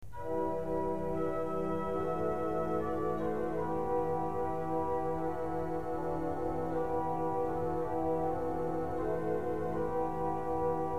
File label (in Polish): unda maris